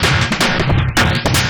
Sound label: DRUMLOOP D+B 160, Drum+Bass Loop; eJay